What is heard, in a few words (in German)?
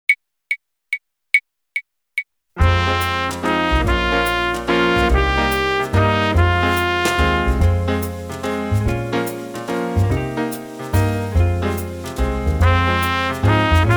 Echo Ubung